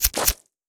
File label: Shoot